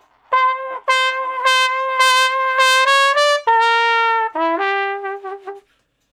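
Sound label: Trumpet Slow Blues (G IV, I), solo, R&B; Solos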